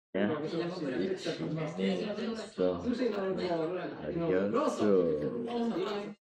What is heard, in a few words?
singing